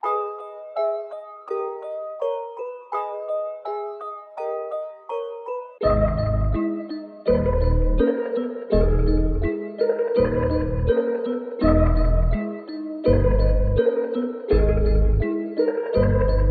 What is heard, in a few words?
166 BPM G# Min, Melodies